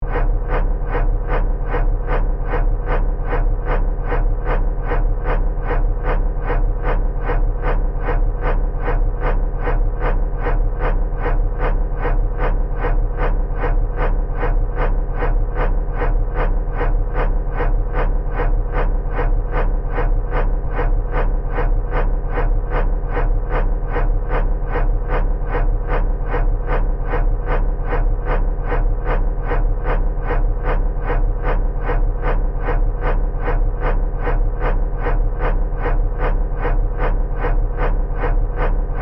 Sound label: Fan Blade Rotate, Steady, Science Fiction; Spacecraft; BlastWave